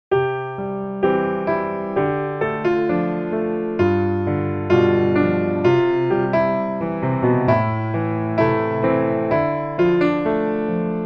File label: piano